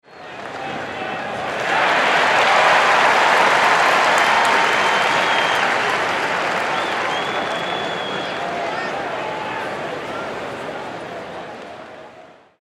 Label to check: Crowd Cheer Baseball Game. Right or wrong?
Right